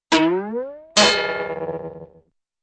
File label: string pluck clank and vibrating boing